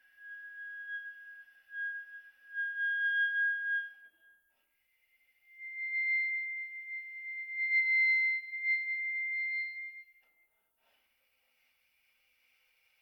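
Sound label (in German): ten, Zahntöne, Gehaltene Töne leise, Glissandi; Spiel mit Klappen, dann gemischt mit Stimme, Flatterzunge und Doppelzunge. Tenor; saxophon; spieltechniken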